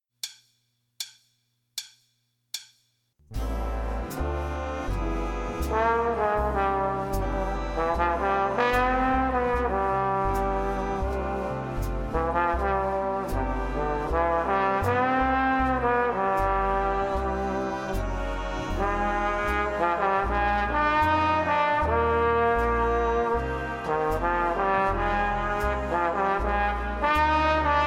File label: trombone solo